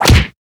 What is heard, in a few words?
hammer hit; ddnet